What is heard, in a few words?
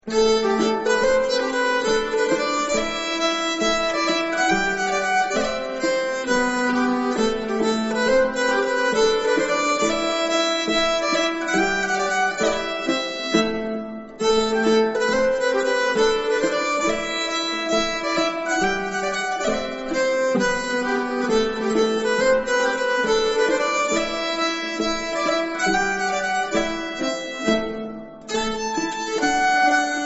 schottis, nyckelharpa; med ett s